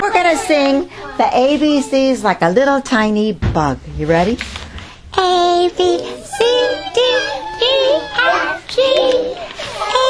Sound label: ABCs tiny voice